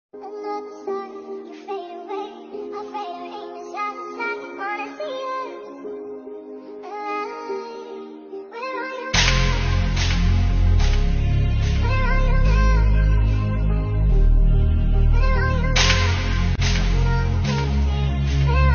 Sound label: laughter sound effect background music